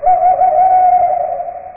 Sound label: owl; desktopmagic